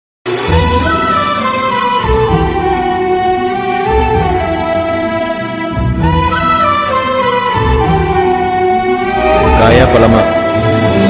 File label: love bgm ringtone